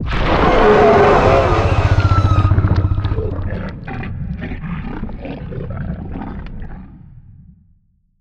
voidspawn death; Stellaris; distant stars

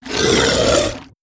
swarm generic idle; Stellaris; other entities

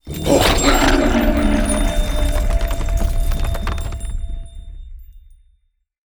lithoid angelic; lithoid portrait; Stellaris

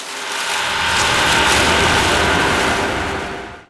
arid planet; ambient; Stellaris

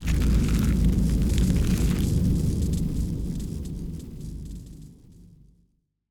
astral scar planet; Stellaris; machineage; planets

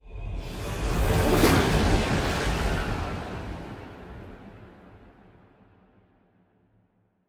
ship titan move; Stellaris; machineage; ships

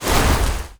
space whale hit; weapons; Stellaris

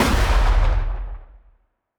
lightning muzzle lightning crack, weapons; kaiju; Stellaris; biogenesis

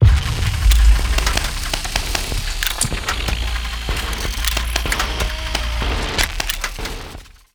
event synthetic evolution; Stellaris; utopia

